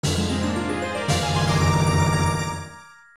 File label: Fanfare Heart Container; Zelda; OOT